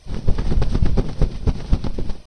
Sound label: shake dry